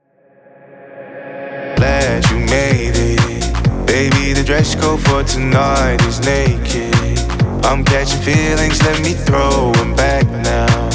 BPM 128

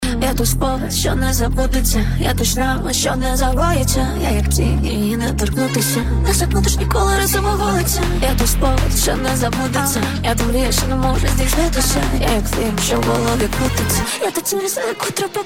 Remix

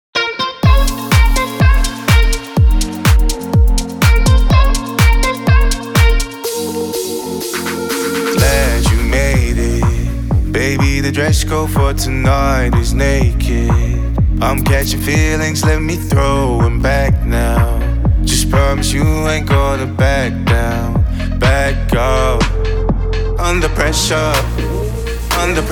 BPM 124

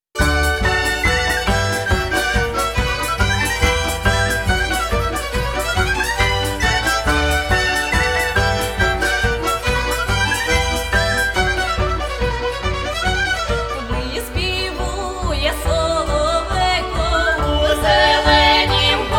Ukrainian folk group; Виспівує Соловейко

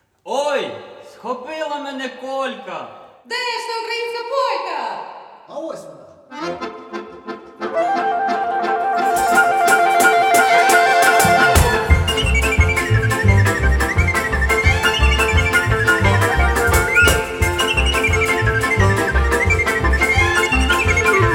Ukrainian Folk Group Ukrainian Polka

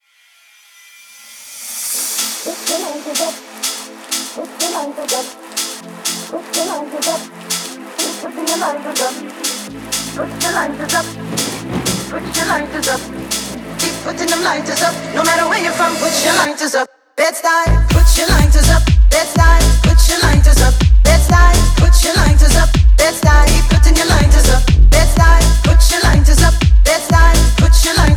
BPM 124

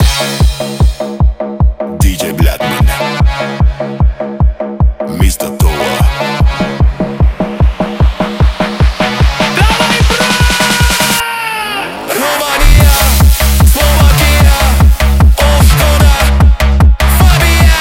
PHONK

